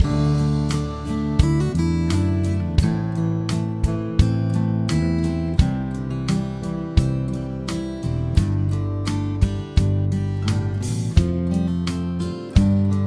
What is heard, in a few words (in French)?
Impro pop lente Am, Guitare